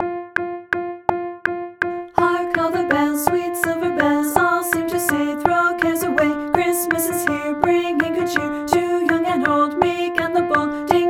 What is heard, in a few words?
carol multi part clicks; carol of the bells